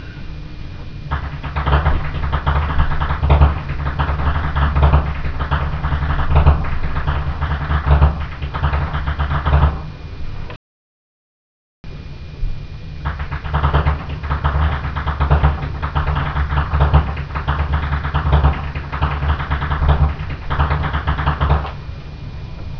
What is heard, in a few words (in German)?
schnalzen, jugend; Sillersdorf